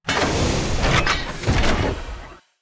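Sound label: mortar knee to stand; Titanfall 2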